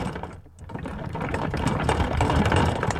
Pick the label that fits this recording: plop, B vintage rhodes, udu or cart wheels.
cart wheels